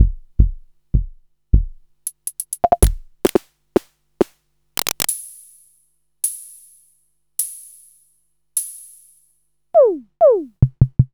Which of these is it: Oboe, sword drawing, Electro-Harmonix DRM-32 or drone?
Electro-Harmonix DRM-32